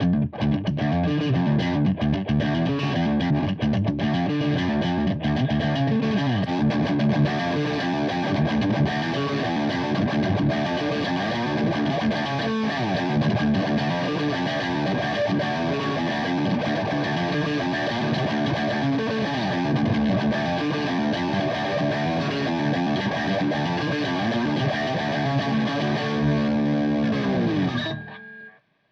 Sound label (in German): Verzerrer Pedale Boutique; Revv G3